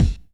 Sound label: jungle kick